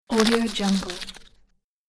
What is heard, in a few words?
rpg gear scroll